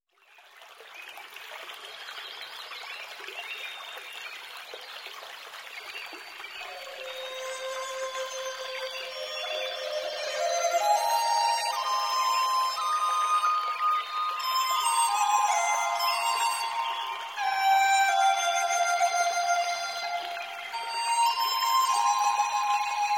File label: Meditation